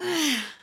Grunt; Lewd SFX

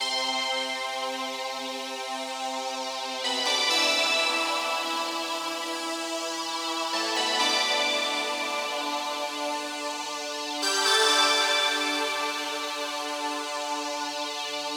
Bell Waves

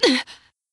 Grunt; Lewd SFX

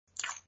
Suck; Lewd SFX

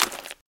Smack; Lewd SFX

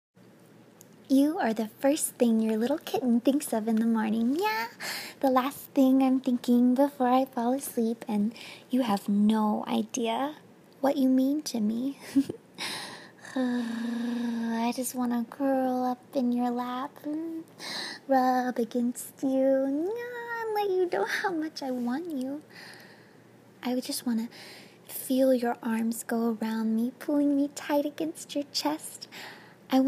Kitty Purring, nekogirl

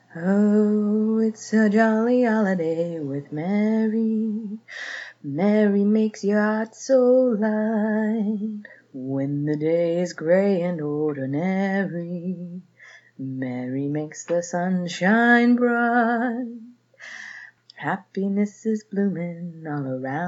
A Singing Telegram; Feel Better

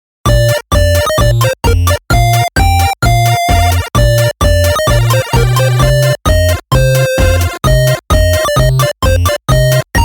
Polka (8-Bit)

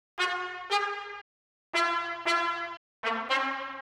brass; Pony Fan Music Remix Stems

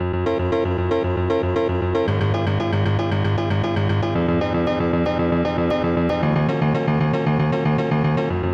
piano; Pony Fan Music Remix Stems

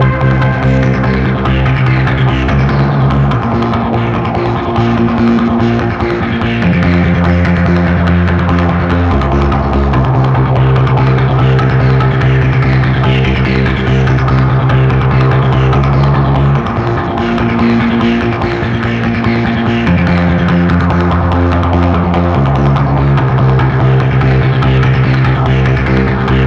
distorted, 145BPM

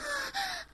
Moan

